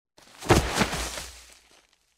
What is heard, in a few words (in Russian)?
v travu